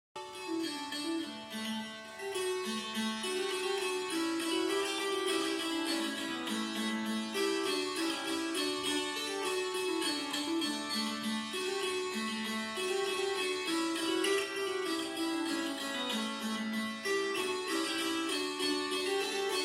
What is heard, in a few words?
kantele shred